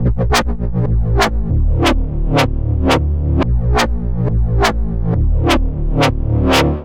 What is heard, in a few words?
Fuzz Step D Minor 140 bpm, bassline; MIDI Clips; Tonal; Skitter and Step; Ableton Factory Packs